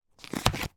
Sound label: game monopoly game card turn over on playing board